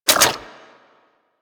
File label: Inf Vomit Gun Reload; Warframe